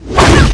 cat impact

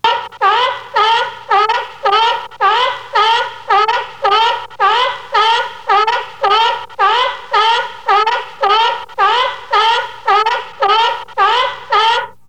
Seal; Ed Edd n Eddy; Adromulus Pack

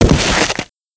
Body Impact; Physics

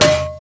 Impact Metal; Ed Edd n Eddy; Adromulus Pack; EEnE

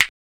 Snap; Ed Edd n Eddy; Adromulus Pack; EEnE

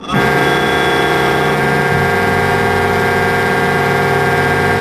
tuba; Nazz's Tuba; Ed Edd n Eddy - Adromulus Pack; EEnE

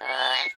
Mouth - Noise open; Ed Edd n Eddy; Adromulus Pack

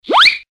Slip

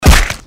Punch

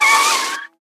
Car - Hit The Breaks; Ed Edd n Eddy; Adromulus Pack; EEnE

